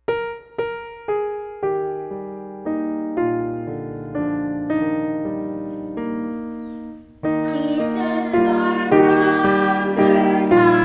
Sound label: Friendly Beast Children